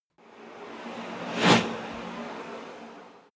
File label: fly by electronic soft fast